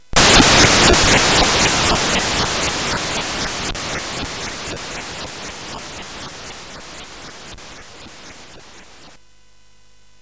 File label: Frantic, CSG8580R5